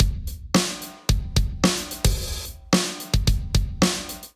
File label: Kit groove 110 bpm; Plymouth Kit groove 01 110 bpm; Ableton; Factory Packs; Drum Essentials